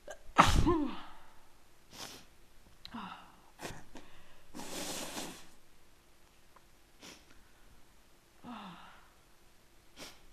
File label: more sneezy cold, female